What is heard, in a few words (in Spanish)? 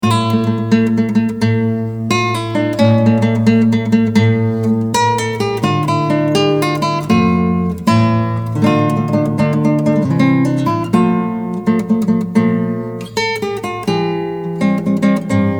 Marcha en Fa